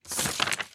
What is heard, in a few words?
tile flip